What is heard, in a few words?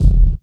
bass drum, 150 BPM; Ueberschall; Drum & Bass Resonance